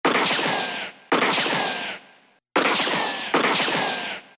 Gun Shot, Roland SC-50 Sound Canvas; Factory sounds

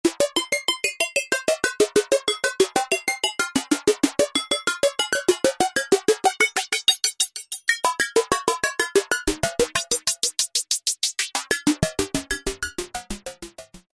Bleeps Perc., Korg microKORG; Factory Voices

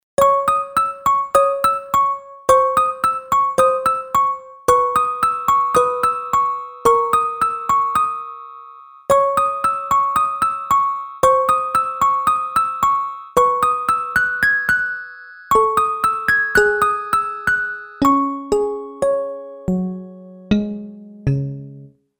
Toy Box, Roland JV-880; Factory Patches

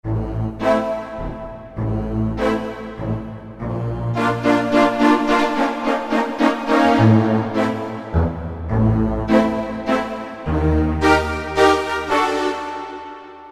Symphony Orchestra, Roland PN-D50-03

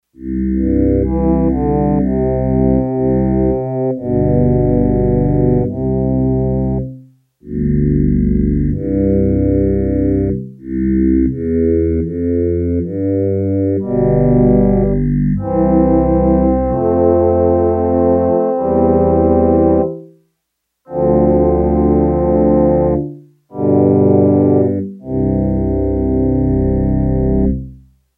Male Choir, Yamaha TX802; Factory Patches; Voices

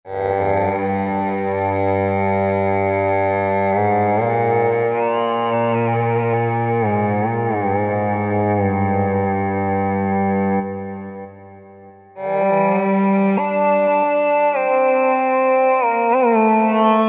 Electromonk, Roland V-Synth; Factory Patches

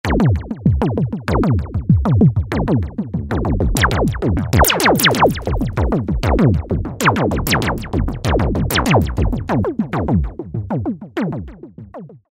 Korg microKORG Future Perc.; Factory Voices

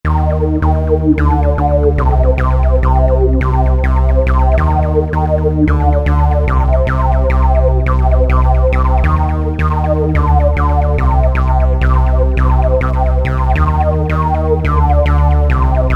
Velo Tekno, Roland JV-2080

